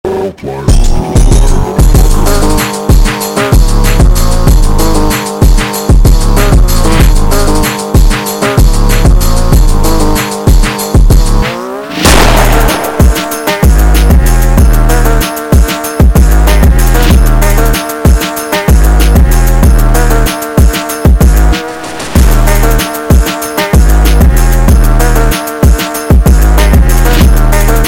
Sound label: Phonk